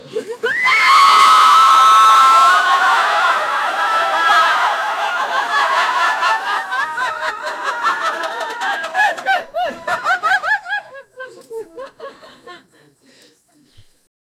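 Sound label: whistle and audience laughing, cheering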